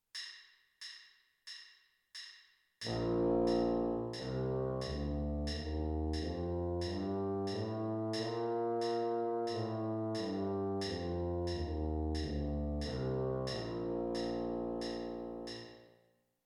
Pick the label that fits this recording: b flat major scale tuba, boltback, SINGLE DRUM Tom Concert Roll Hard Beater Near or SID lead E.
b flat major scale tuba